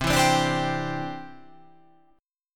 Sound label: C-Major Add 9th